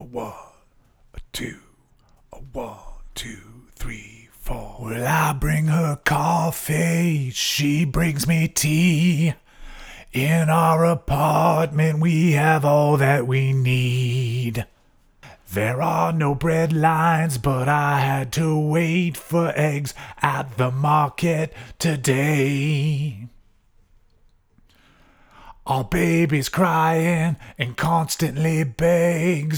Blues

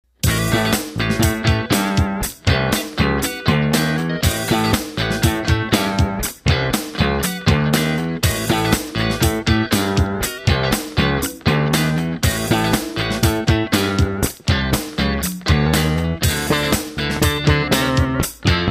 Funk Construction Kit; admiralbob77

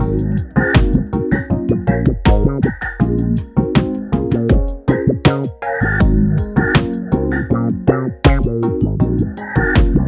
Thunder Rain Inst. Construction Kits

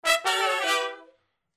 brass loop

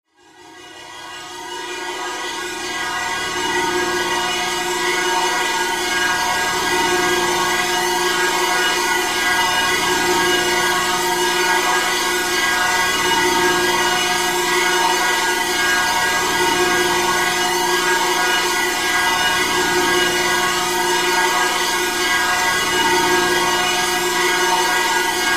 Raspy Pipe Drone

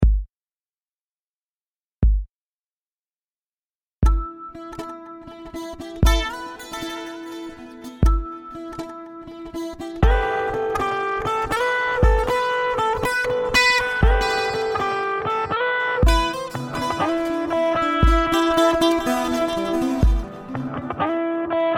Am120 2x GTRs impro

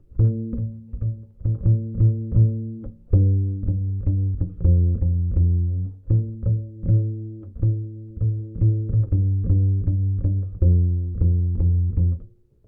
bass part

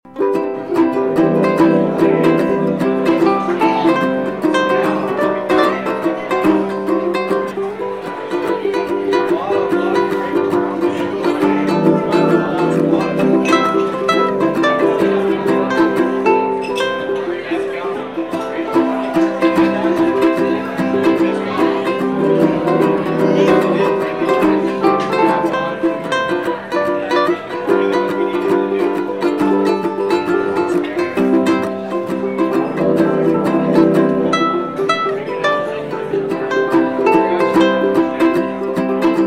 Uke Party Improv

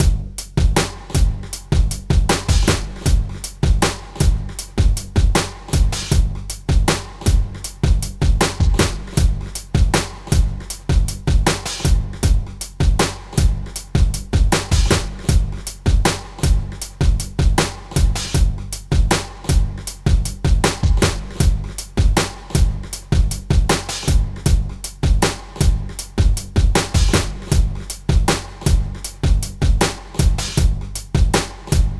City groove

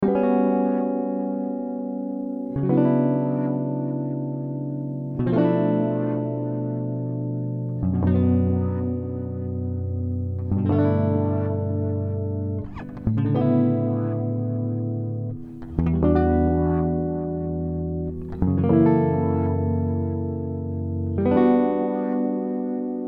Retro Groove

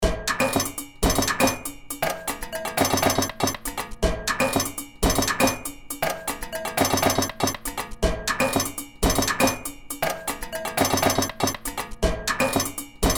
household hits

